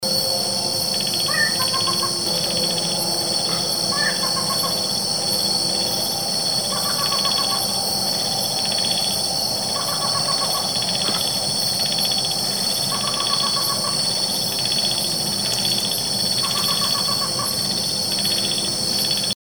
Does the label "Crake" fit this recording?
Yes